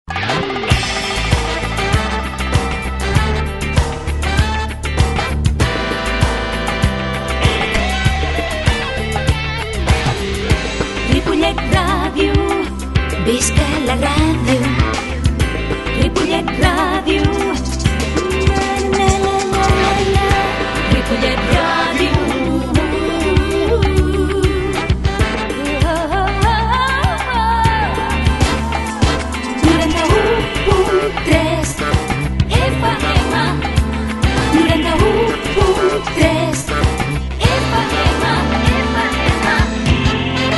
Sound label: radio jingle sintonia big band; Ripollet Comunicacio